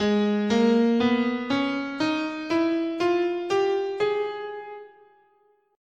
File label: Ab Bebop Melodic Minor, scale